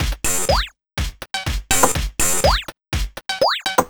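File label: Percussion, 123bpm, Nu Dance Electro; Combi Loops; Zero-G; Soundclash; Acidized Wavs; Pet Shop Toys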